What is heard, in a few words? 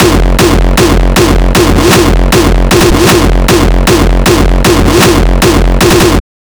kicks loop 155; GhostHack